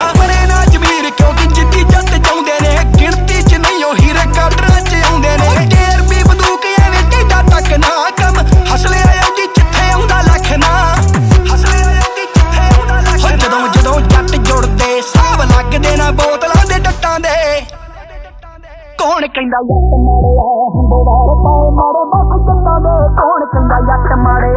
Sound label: Desi Punjabi Ringtone